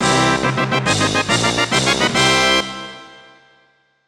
Collect Secret Item; Banjo-Kazooie HD; N64 gamerip 1998

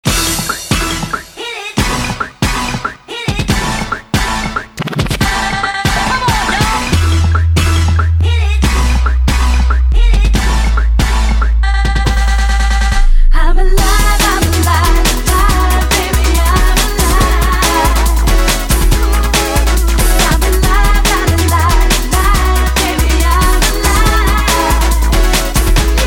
Solo Bass Mix; Dance Dance Revolution; DDR

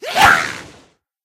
Bird Red Charge; Angry Birds

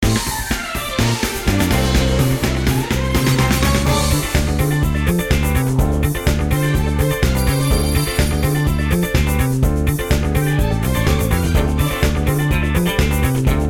Level Cleared

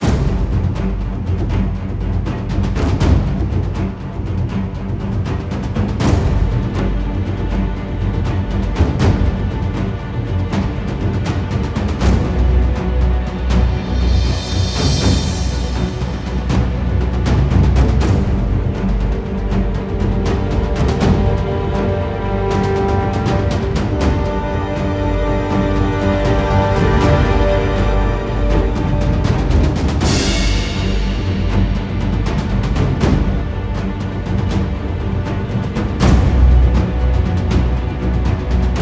Fight Theme Frantic Stem Perc Strings; ryse son of rome 2014; Oswald

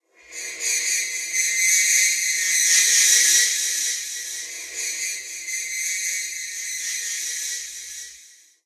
Ambient Metal Screech; silent hill homecoming 2008 gamerip pc ps3 x360

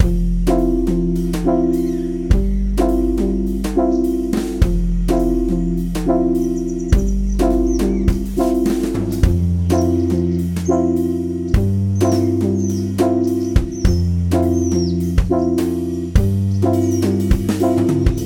Loop; Boulder Attack 2; World 1

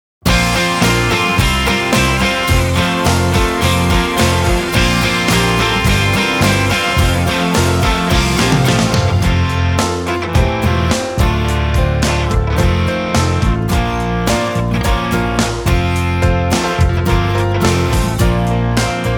Karaoke; Guilty Gear Xrd Sign; Birthday Train

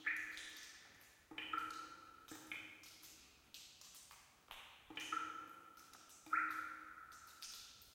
Ambience Cave; Inotia 3

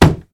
Hitting Desk

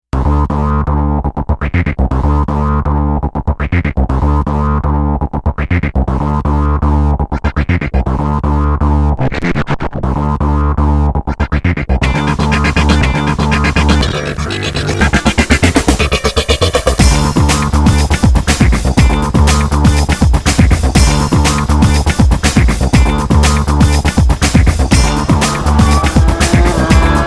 Electronic; American McGee Presents Bad Day LA

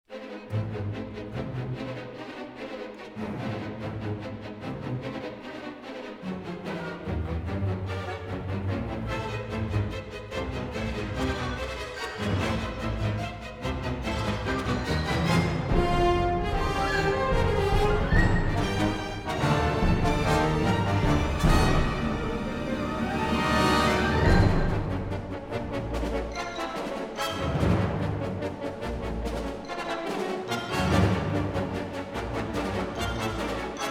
Medium Intensity

